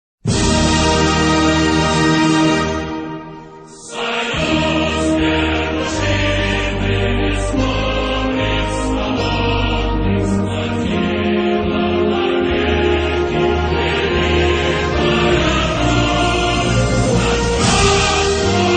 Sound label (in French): hymne national russie